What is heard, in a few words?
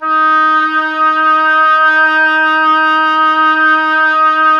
oboe; Miroslav Vitous; Solo Instruments VOL-2